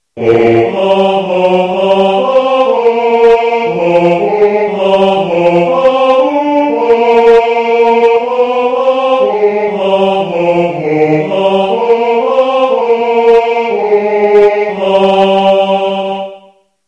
fanfare basses; 3 voix 1

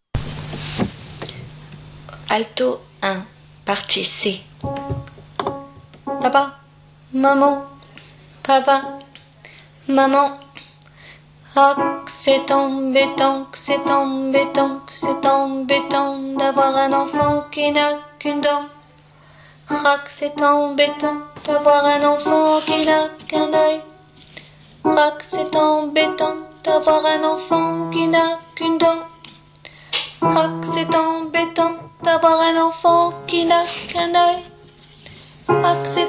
voix d alto; partie C voix 1; Dans la troupe

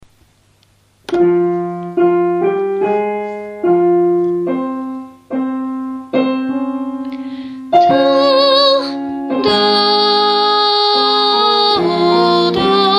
entre le boeuf tenor; Entre le boeuf et l'âne gris

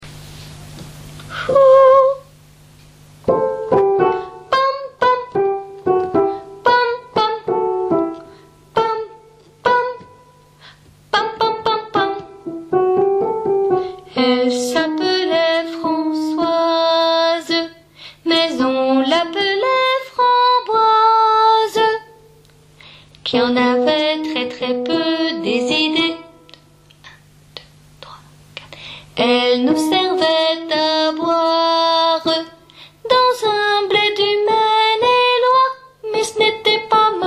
vanille et framboise hommes tenor

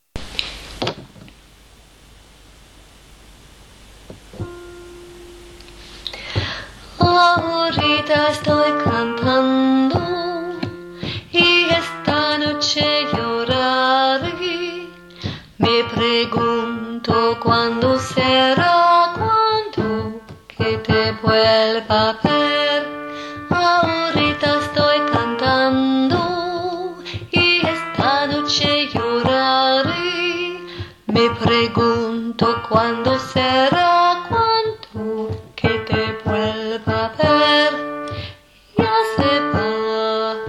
basse seul tempo lent

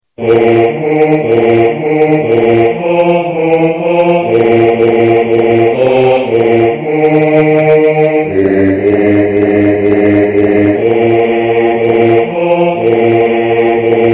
fanfare basses; 3 voix 3

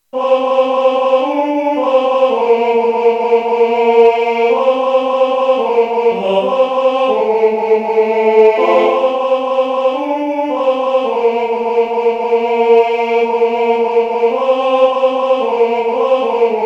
fanfare tenors; midi

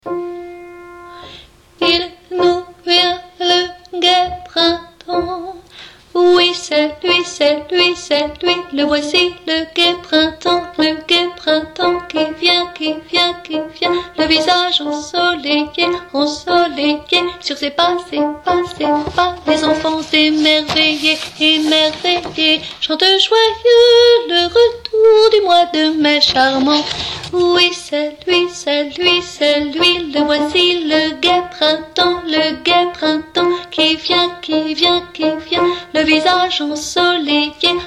fanfare alti; Fanfare du printemps 1

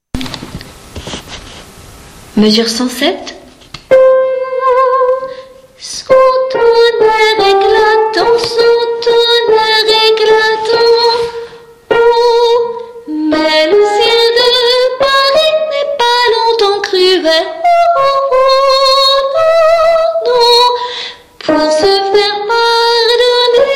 sous le ciel tenor; mes 107 suite et fin

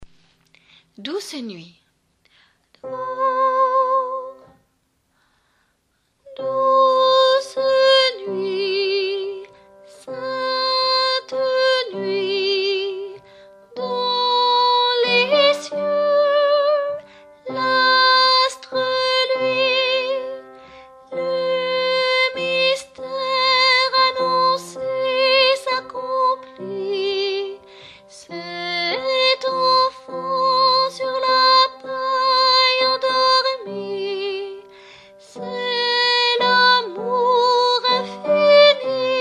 douce nuit, tenor

